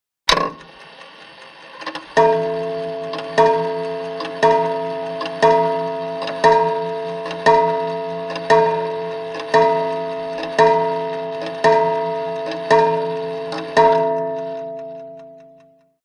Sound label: Carriage Clock Ticki; clock chimes rings